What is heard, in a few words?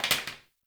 Plastic Broom Drop; Ambience; Gameplay; Warframe; Nineteen Ninety Nine